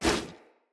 Bow Melee Whoosh; Weapons; Player; Bows; Grand Father Bow

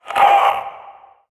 Possessed Red Veil Vocal Pain

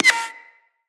Bullet Impact Ricochet

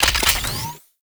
Archwing Attach; Archwing Deploy; Warframe; Cinematics; Rail Jack

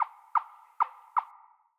Forest Open Glade Chipmunk; Day

